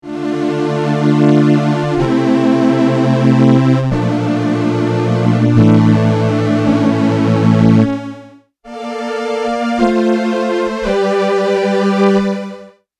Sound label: Korg Delta Strings ensemble mod